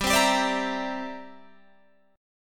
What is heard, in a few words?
C 9th G down Guitar Standard; C9/G strummed